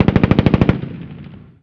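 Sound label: gun assault medium; Call of Duty Modern Warfare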